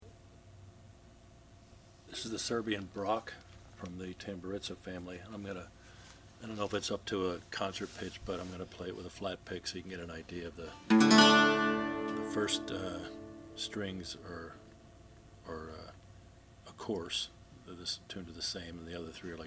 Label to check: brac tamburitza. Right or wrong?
Right